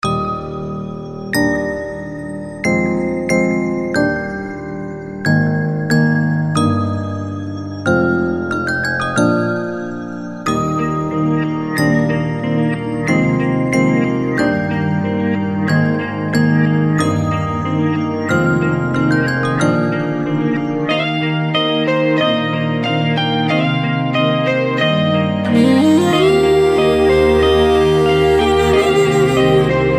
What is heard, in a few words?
Mobile Ringtone Flute And Piano